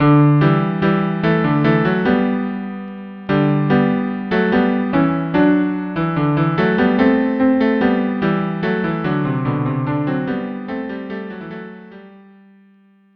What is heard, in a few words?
cello duo